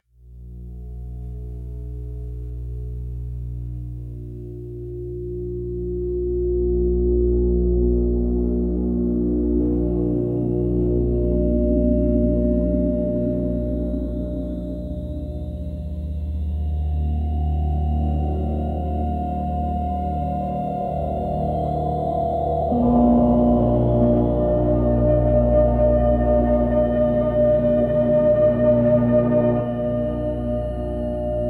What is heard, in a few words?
spiritual spooky soundscapes